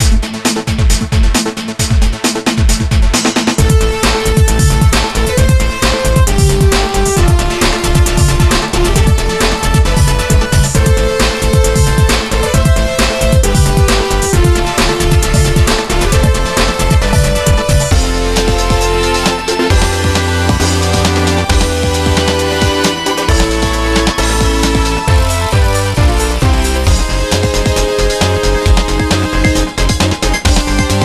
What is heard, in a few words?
BGM Menu; medarot classics plus switch gamerip 2020